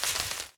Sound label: environment zone thorny bush step